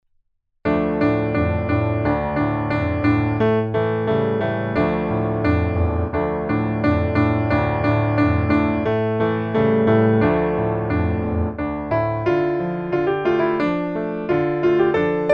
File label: piano